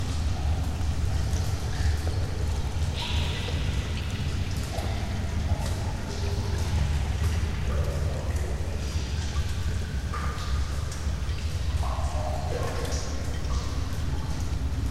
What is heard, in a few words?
ambience - sewers